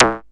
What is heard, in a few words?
blonky, Electronic Hits